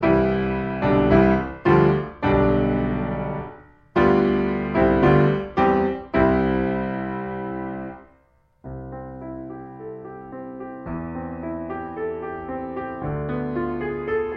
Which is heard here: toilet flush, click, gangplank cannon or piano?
piano